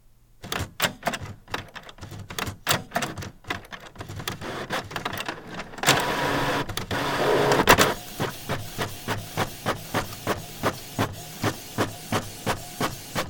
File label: new printer; electric